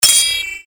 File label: sword hit